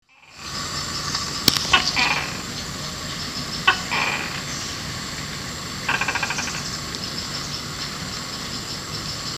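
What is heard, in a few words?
gekko gecko, Tokay vocalisation; Low hills overlooking Borobodur / Kedu Valley, Central Java, Indon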